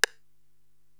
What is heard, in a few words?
Rimshot, Drum Machines